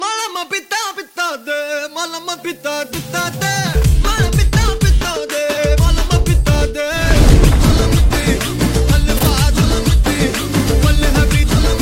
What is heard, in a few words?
ringtone